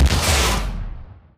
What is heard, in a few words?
atlas eject propulsion; Titanfall 2